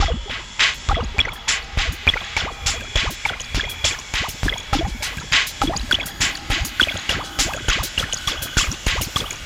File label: BUBBLE MACHINE LOOP, STRANGE MACHINE LOOPS